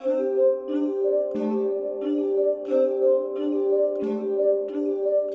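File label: ocean world music glouglou dream intro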